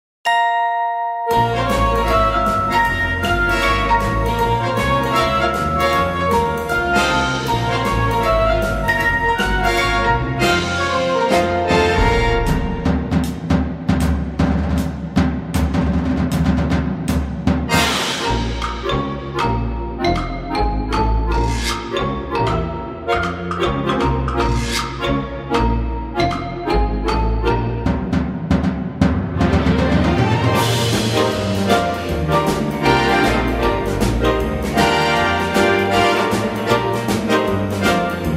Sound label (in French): Corde Musique